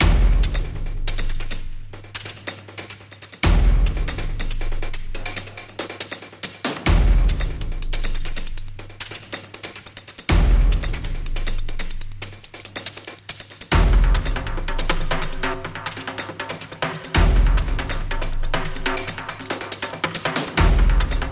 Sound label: Tribal Thunder